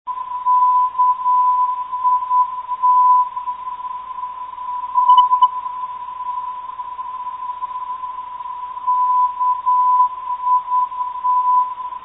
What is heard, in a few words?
328kHz, NDB; kit